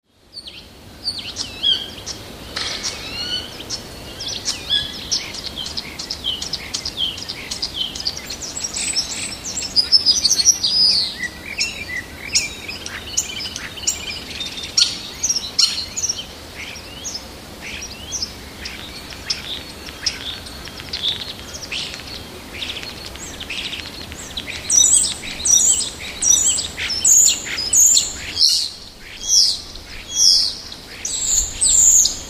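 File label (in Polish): szpaki, głos trznadla zwyczajnego. Głos trznadla słyszalny jest wyraźnie niedaleko początku nagrania; wroblowe; zapozyczenia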